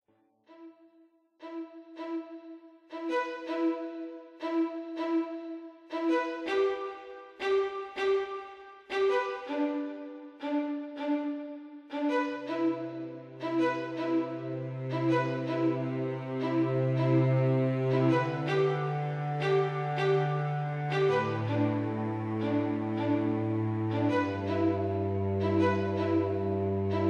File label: Orchestral version